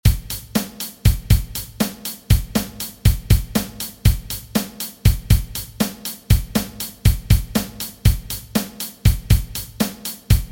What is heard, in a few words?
syncopation